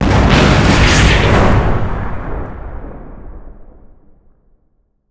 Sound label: self destruct explosion; Earth and Beyond; EA Games